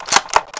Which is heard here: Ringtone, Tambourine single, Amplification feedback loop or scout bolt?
scout bolt